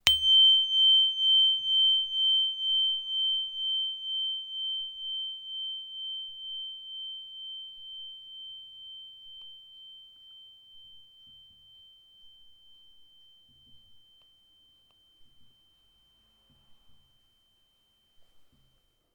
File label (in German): Tageston